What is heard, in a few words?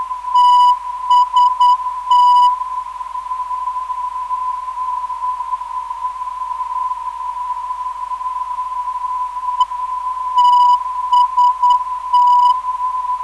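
TST 327kHz, non-directional beacon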